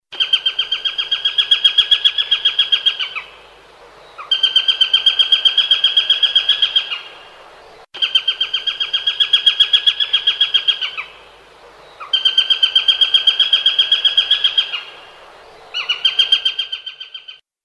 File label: Bird Sparrow Hawk